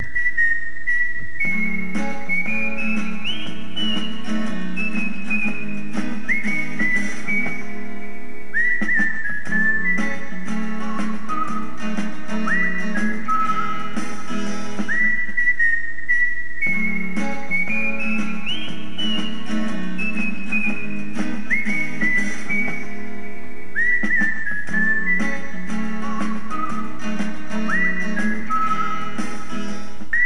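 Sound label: Is Calling R&B, ringtones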